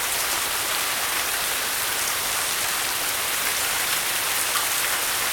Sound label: Rain; kult